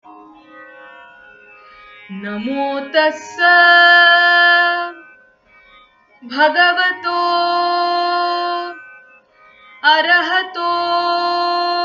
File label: buddhist prayer